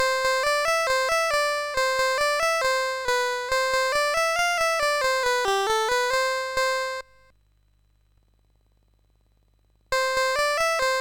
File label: Yankee Doodle, MOS6581R4